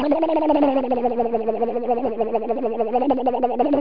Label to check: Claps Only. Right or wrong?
Wrong